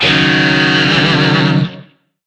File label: Shawzin Yo Chord D; Warframe; Lizzie Shawzin; Chords